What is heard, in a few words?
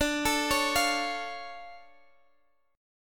D Minor Major 7th D down Guitar Open G